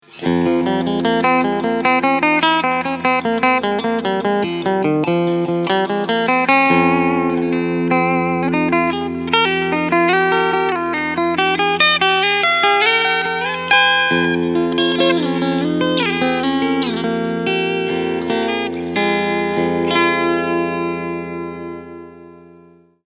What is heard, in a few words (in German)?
Cleansound Steg